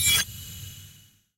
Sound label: UI Flourish